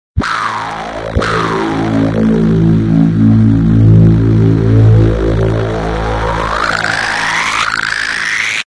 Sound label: 3d Surround